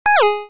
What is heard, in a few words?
button